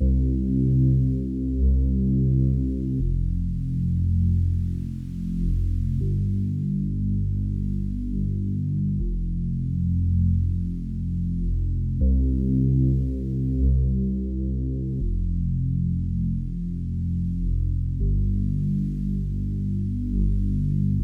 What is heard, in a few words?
80 BPM C Min Bass; Lofi; Bordeaux